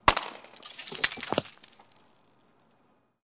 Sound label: exploding lightbulb; Weird and Interesting Hits; single hits